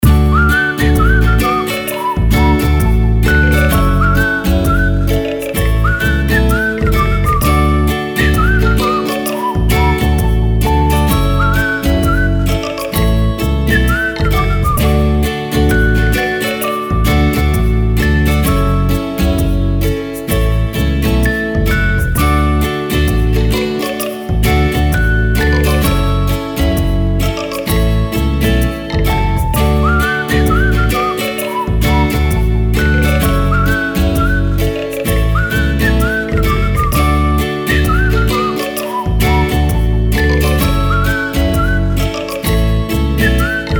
Whistling Advertising

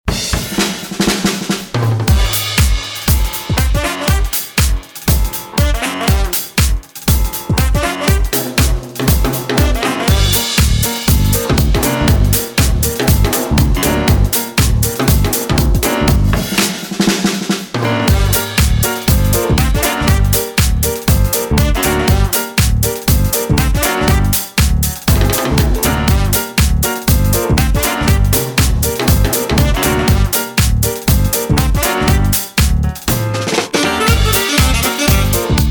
Catchy Swing, electro swing

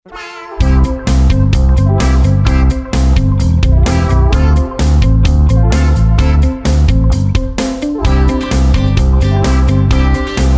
kids vocal, music for kids videos

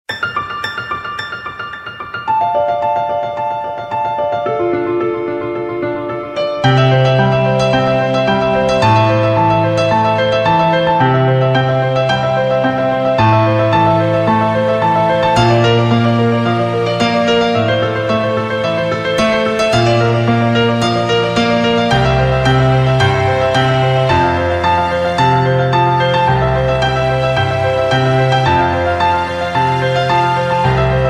Inspirational Piano

